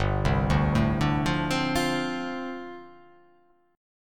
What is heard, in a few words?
G 9th Suspended 4th